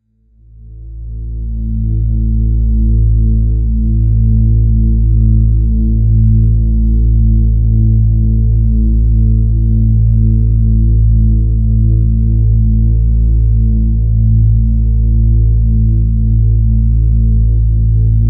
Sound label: ambient